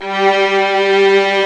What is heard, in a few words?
VIOLIN; E-MU Formula 4000 Series Vol. 3 – Analog Odyssey; VK+ Instruments